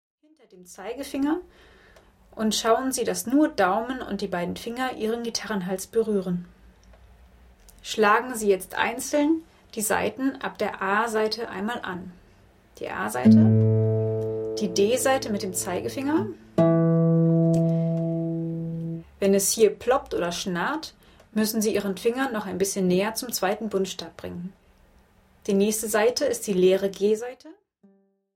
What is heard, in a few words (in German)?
der dritte akkord a7